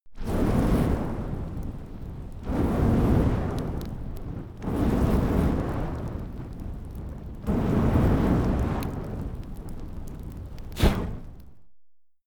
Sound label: flamethrower short multi